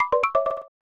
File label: Sing